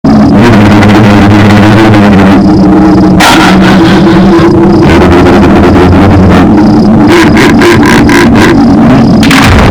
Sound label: camel laugh; sphinx